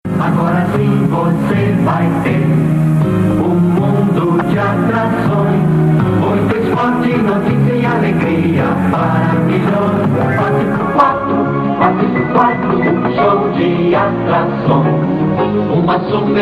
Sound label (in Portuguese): Primeira vinheta; SBT; TVS SP; 1981